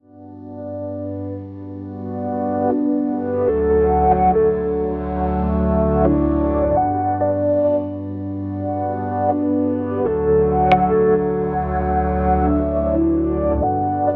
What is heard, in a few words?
slow pulsing beat gentle, 78 bpm